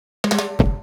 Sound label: Fill